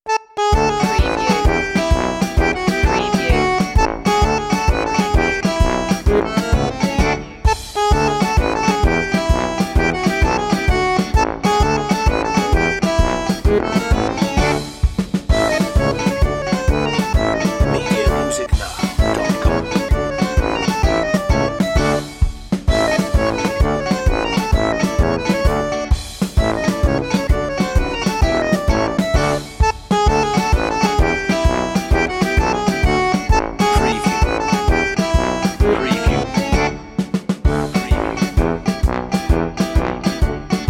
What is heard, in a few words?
polka no voices, royalty free music eastern european; world influences